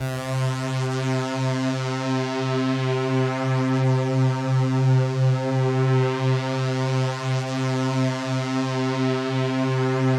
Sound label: Pad C, Synth Prophet One Shots; Electronisounds